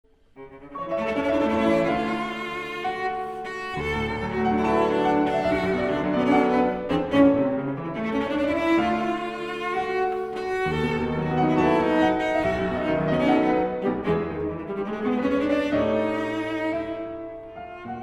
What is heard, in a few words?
Duo Arp; Frantz